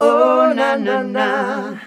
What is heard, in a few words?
"OHNANANAH", MALES; Best Service; Hyper Dance